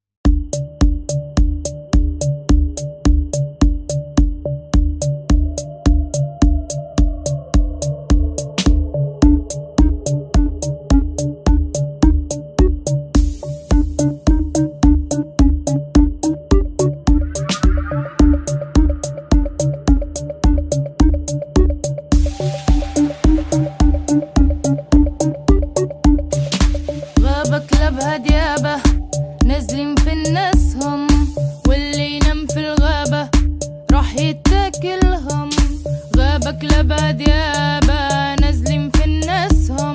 arabic viral song

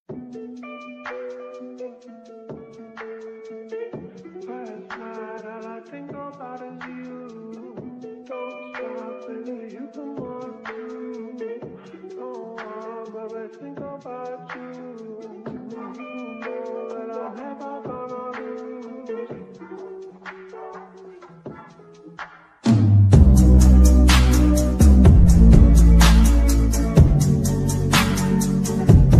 slowed and reverb

